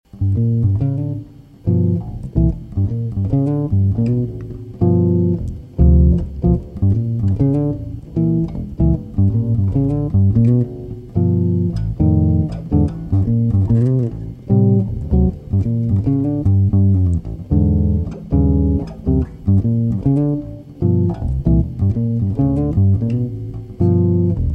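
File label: blues, guitarist, jazz; solo bass version; key of G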